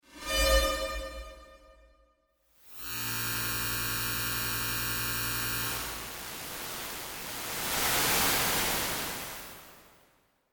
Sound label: Whoosh Transition Sci Fi Materialize Long Static, Whoosh Transition Materialize Quick Zap Static Transformation; Whooshes (Sound Effects:Sound Design)